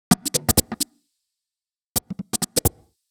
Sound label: Drum Loop 130, Downtown House; Drum Loops 130bpm